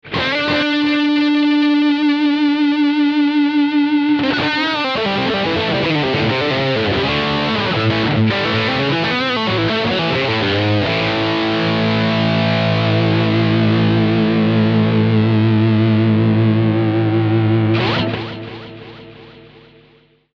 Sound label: ducking dly vib